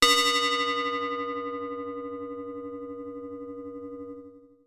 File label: Ring Mod Bell